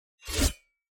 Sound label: crusader strike sword